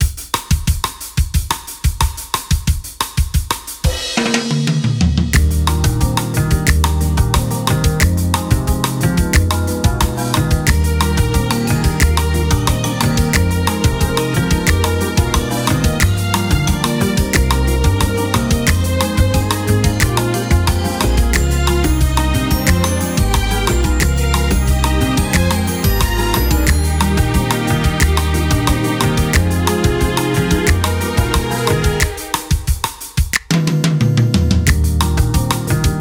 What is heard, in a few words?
subgame sync swimming